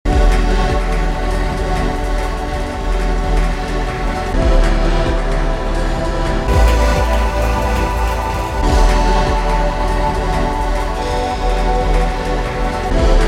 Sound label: square flutes